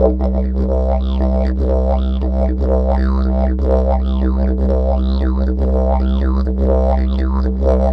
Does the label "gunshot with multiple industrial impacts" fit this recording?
No